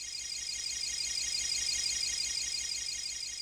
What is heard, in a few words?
Float; Navi; Zelda; OOT